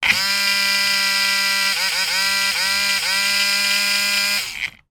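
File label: toy remote control car turn wheels tilt motor whir still upside down; zapsplat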